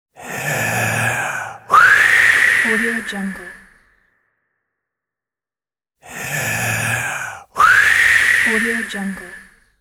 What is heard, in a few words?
giant huff puff blow